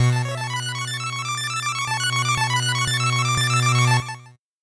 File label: 8bit jingle normal